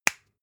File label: spice jar smack close